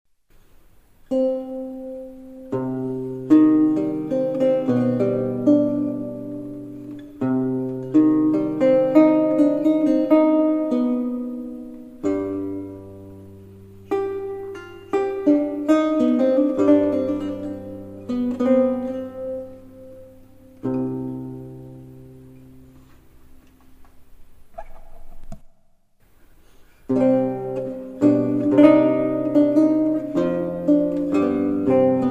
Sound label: prelude menuet